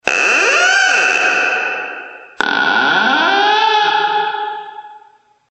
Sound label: door